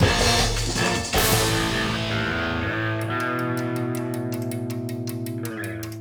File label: Action END; bolt 2008 pc gamerip